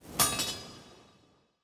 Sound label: ambience room forge weapon ready